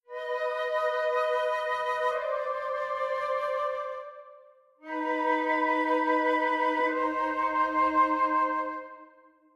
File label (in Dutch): dissonant oplossing